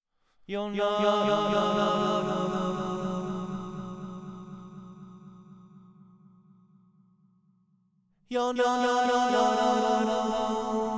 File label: vocals; overloud otd 2